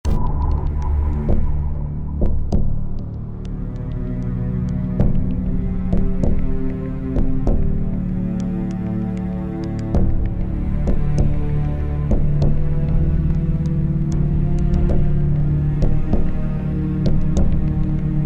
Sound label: dramatic suspense score